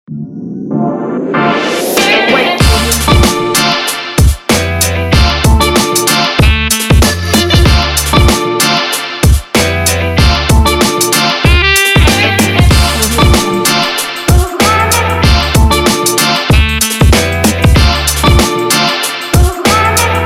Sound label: vlog hiphop